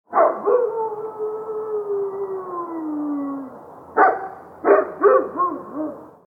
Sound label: dog barking and howling sound